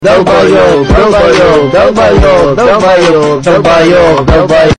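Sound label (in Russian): хоровое пение парней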